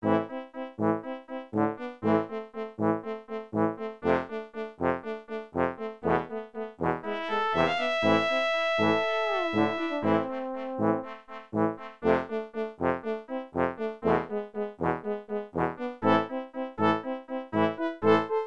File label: Excitement brass quintet